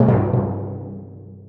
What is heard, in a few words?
Percussive Stab